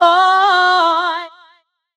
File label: VOX FX; Music & Instruments; neuro; Stanza